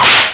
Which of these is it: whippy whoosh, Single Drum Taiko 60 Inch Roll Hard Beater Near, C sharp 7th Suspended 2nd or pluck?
whippy whoosh